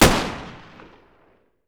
vollmer fire; mg; garrysmod

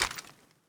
draw, weapons; garrysmod

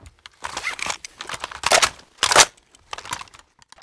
rpk reload; garrysmod

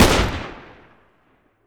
m60 fire; mg; garrysmod

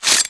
knife hit, weapons; garrysmod; knife fas

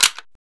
p90 clip release; garrysmod; p90cstm

